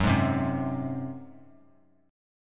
F sharp Suspended 2nd Flat 5th F sharp, Chord; strummed; JGuitar

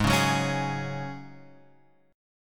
Ab 7th Suspended 4th Ab Guitar Open F; Ab7sus4 strummed

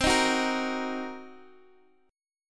C Minor 7th Flat 5th Guitar Open G, chord

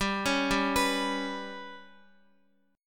G Major Flat 5th G down Guitar 4F 4A 5C 5G 5C 6E; GMb5 arpeggiated; JGuitar

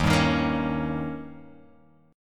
D sharp 7th D sharp, Guitar Open G

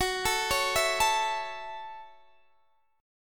Gb Minor 7th Gb, Guitar Open D; x,21,19,19,19,19; down; chord shape

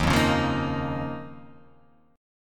D sharp 7th Suspended 2nd

